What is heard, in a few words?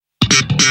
bass